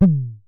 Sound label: Synare Filter; Star Instruments Synare 3